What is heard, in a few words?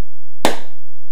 balloon, Anechoic; OPENAIR